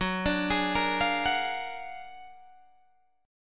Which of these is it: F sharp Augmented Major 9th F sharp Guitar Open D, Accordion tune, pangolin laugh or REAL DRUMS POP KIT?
F sharp Augmented Major 9th F sharp Guitar Open D